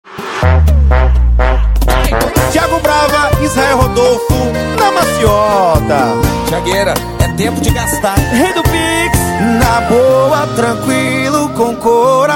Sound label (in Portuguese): sertanejo com locutores de rodeio